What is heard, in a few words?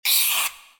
Whipped Cream; Cookie Jam